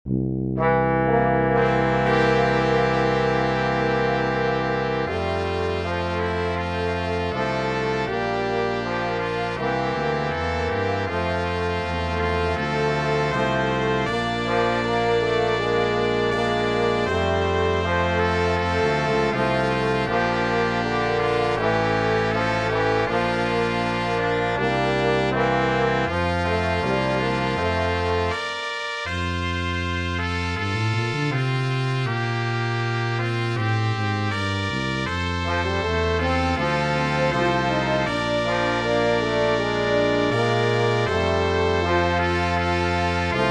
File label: Auld Lang Syne Brass Quintet